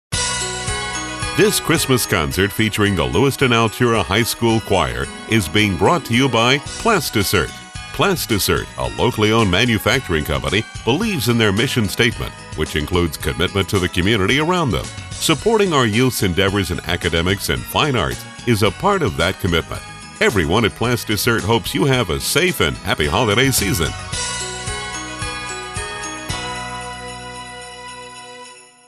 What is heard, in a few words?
CHOIR Concert; PlastiCert; local high school concert broadcast